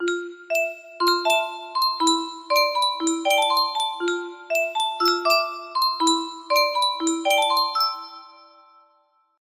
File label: track 2 electric piano